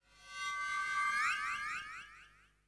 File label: ethno 125